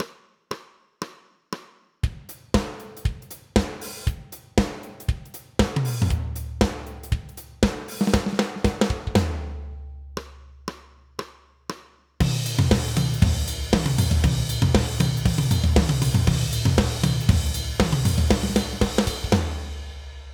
drums, unrealistic

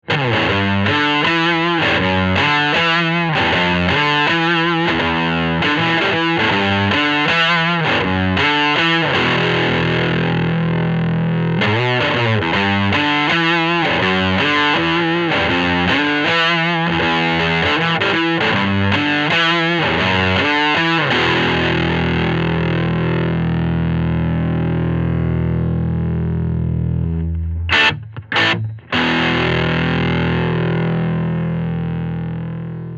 tube drive; tube drive into holy fire; How to Get a Fatter Guitar Sound with Cascading Overdrive

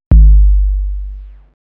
808 Bitcrushed

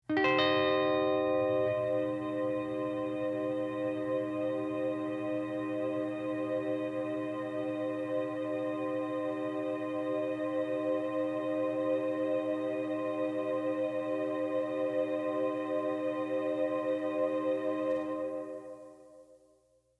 big sky strum infinite sustain

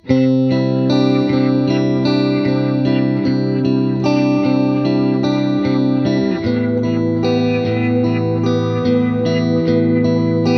Guitar; Timeless 2

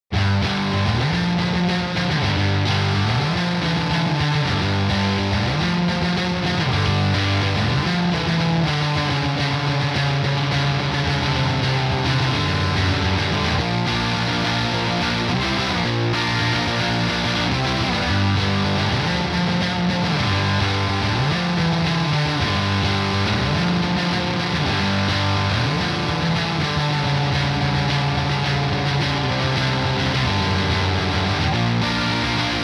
Reamped Guitars Only

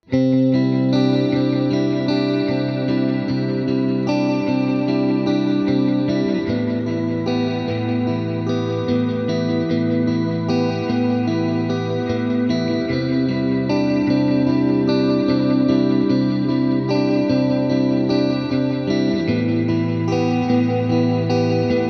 Guitar Valhalla

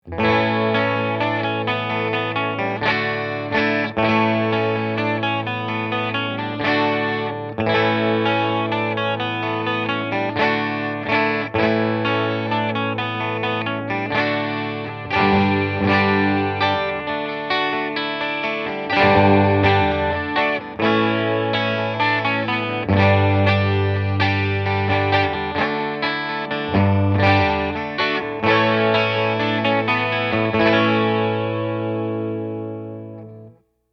gemini pickups AC15; ric; AEA

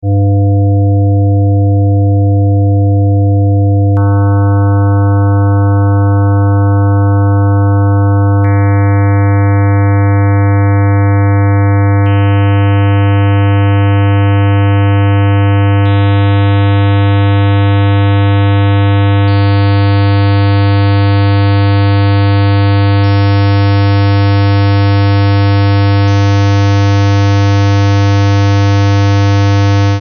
square wave example

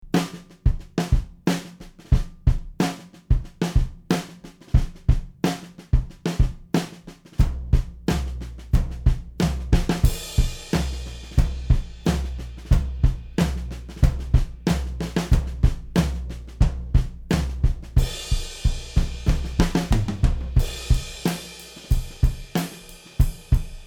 snare stortion without raw

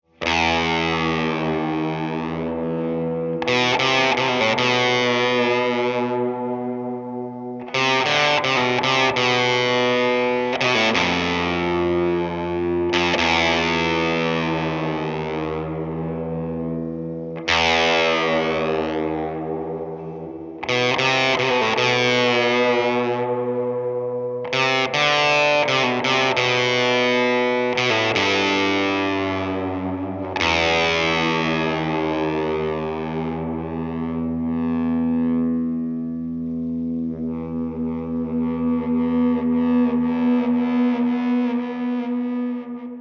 spaghetti western; maestro; demeter reverbulator

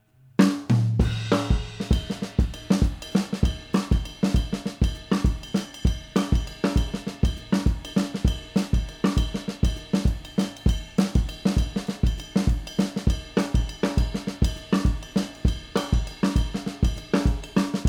Drums

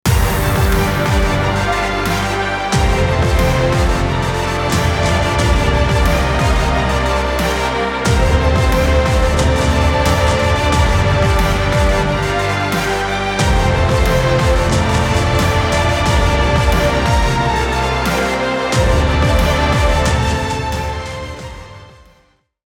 String Buss Full

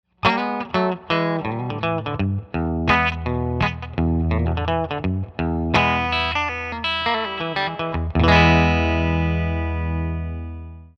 fender heavy

